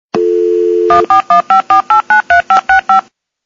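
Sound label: Dialing 3D Tones; Ringtones; Dailing 3D Tones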